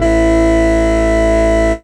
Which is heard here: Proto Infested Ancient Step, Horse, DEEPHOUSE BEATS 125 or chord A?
chord A